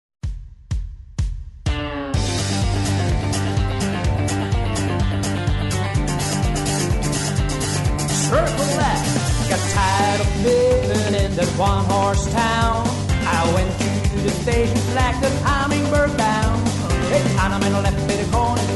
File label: hummingbird vocal